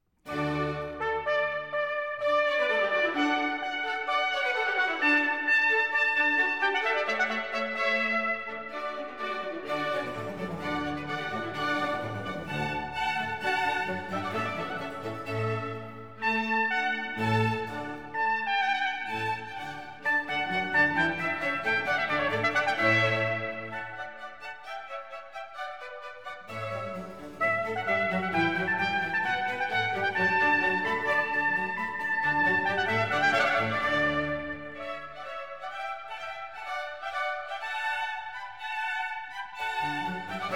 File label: Overture